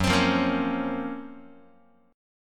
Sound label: Gb Major Sharp 11th Gb Guitar; GbM#11 strummed